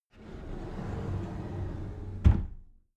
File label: cupboard slide door close soft